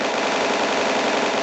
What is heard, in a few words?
break; Gant